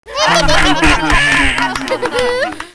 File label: laughtrack